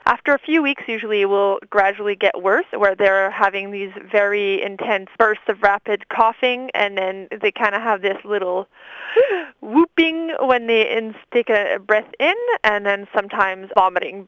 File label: whooping cough